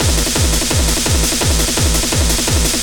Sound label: LOOP, Eurotech; Sounds Good